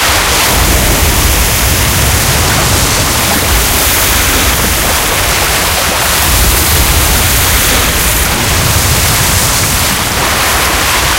tire water int, tanks; engines; War Thunder

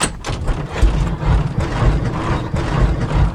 Aircrafts FW-190D9 Canopy Open; DCS

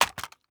lr300 buttstock unlock; Rust

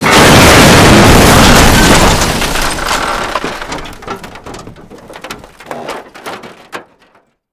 car crush; bog; Call of Duty Modern Warfare

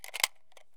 mosin round load; Escape from Tarkov

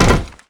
grunt iron land

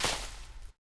Foot Forest; Civ4 warlords

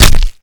Projectile Sounds; Red Orchestra 41-45

